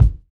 clear kick single shot a sharp key, Royality free kick single shot tuned to the A# note.; kick drum samples